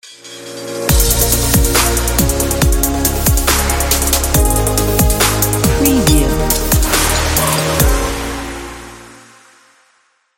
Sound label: hip hop ident